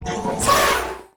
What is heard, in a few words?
RECRUIT ATTACK; BARAS; Mob